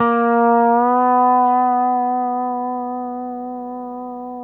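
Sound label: pedal steel bend up A#3, Left; PEDSTL BENDS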